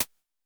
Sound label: HH Close, Vermona Mono15 Drums Hi-Hats